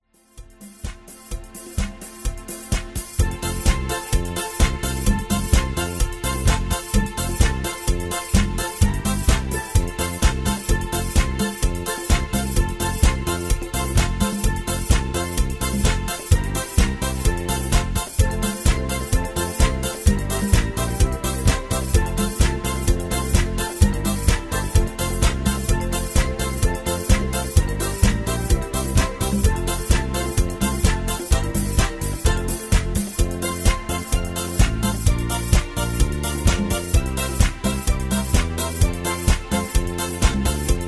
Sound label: Patter